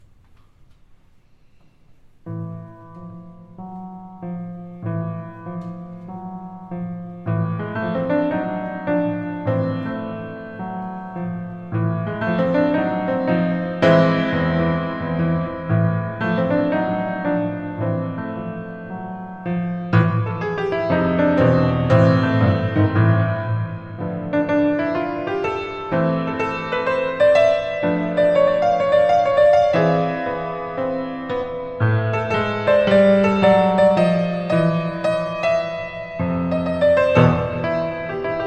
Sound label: Clarinet Blues; Recital